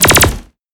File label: Laser Gun Salve; Sample Audio Pack; Free Pack